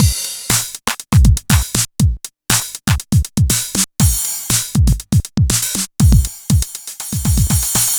FUSION; Wizoo - Platinum 24 Electronic; DEMO KIT

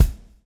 swing

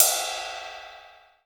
RIDE, AMBIENCE KIT; AKAI S6000 CD-ROM - Volume 1; DRUM RYTHM

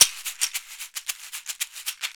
Caxixi Beats; Roland LCDP14 Africa VOL-2

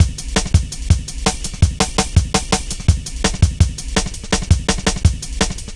Drum Loops, Jungle

